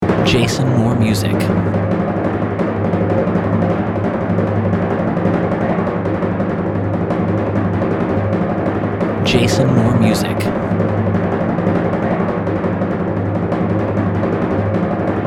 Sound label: Timpani Roll